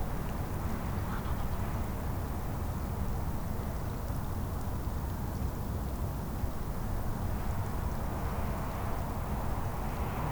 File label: tidal flats, ambience; pf2e; hells destiny